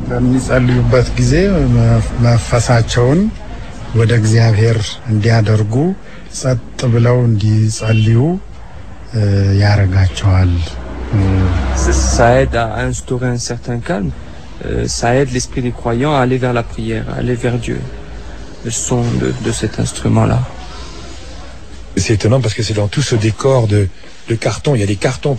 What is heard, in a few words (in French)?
Harpe